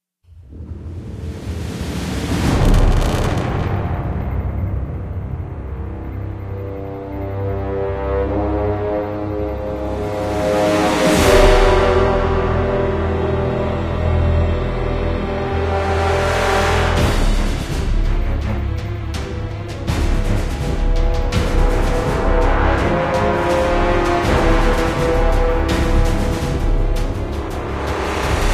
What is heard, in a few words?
Enemy Ship Reveal 165bpm